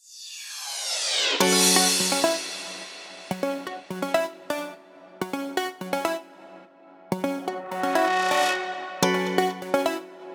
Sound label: cluphouse, house electronic techno; By Burak Sound Bank; house electronic techno vol 5